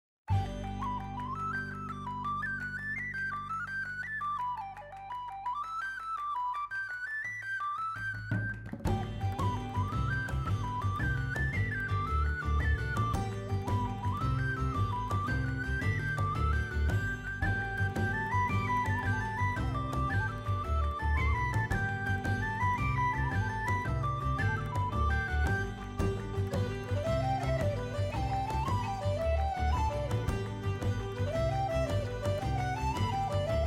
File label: Scene Town